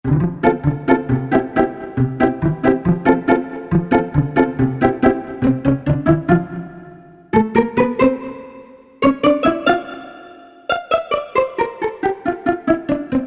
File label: Pizz-a, Korg MSC-2S Synth; Synth 1